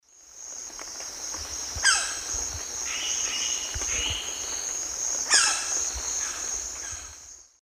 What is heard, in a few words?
Phoenicircus nigricollis, calls 2 males; S. America; Eastern Lowlands